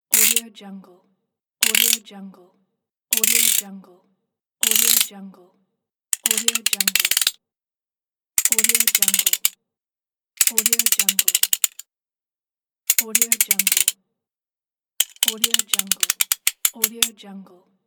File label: stationery knife